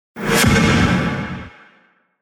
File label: Whoosh